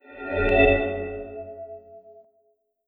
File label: autosave